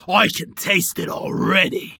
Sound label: Bar Cheering When Somebody Orders Drinks